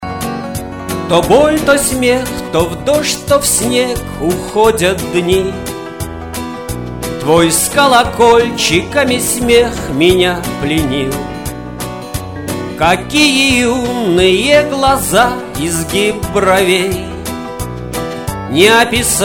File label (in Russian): smeh